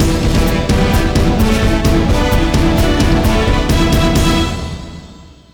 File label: Victory; ben 10 ultimate alien cosmic destruction; nintendo ds gamerip